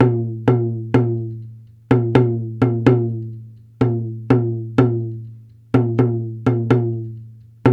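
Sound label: 125 bpm, daiko ensemble; Spectrasonics; Supreme Beats; World Dance; Asian Daiko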